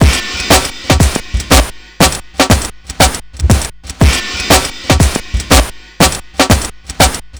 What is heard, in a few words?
TRIPHOP; INIS; Funky Xtreams